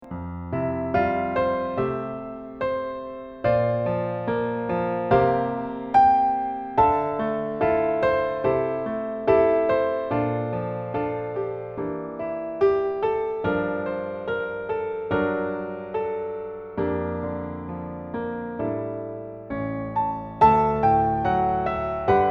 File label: accomp